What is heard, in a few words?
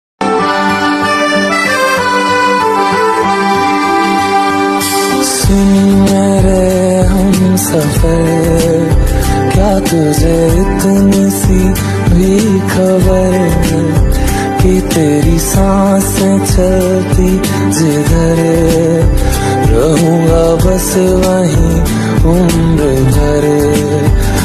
male version ringtone